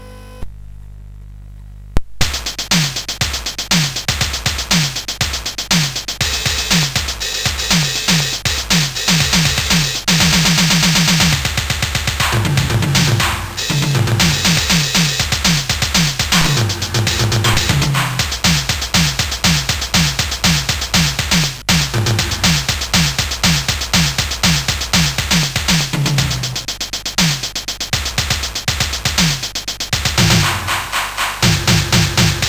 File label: Digi Drums, sid CSG8580R5